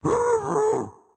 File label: Dash Wind Up; Caffiend Caelan